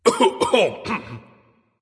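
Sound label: nonverbal clear throat; smite; voicepack; ullr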